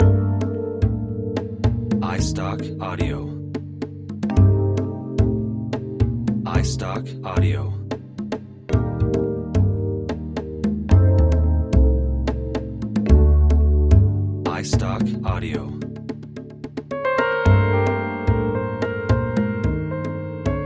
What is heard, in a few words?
Mysterious Loop